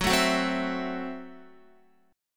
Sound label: Gb Minor 7th Flat 5th, Guitar; Gbm7b5 strummed; x,9,9,11,12,12